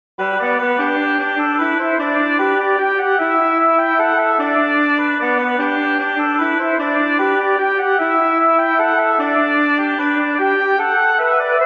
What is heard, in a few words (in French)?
Mazurka; de torr-penn